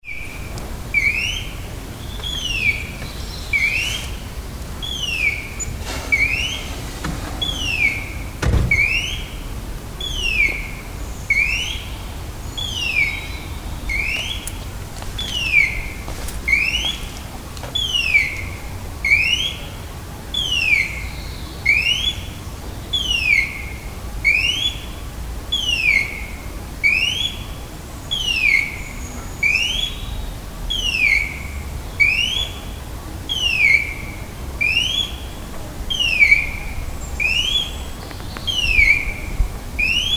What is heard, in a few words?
Noise